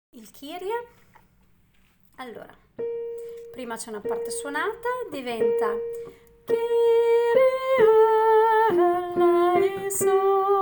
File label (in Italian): kyrie soprani